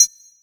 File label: Digi Perc; Percs